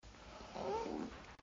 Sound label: Moan; Lewd SFX